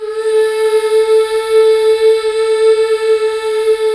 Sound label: MMHH A4; Best Service Gigapack 1